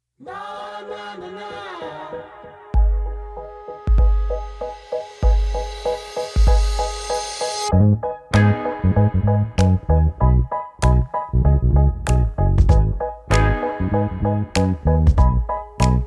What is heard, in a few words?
Funky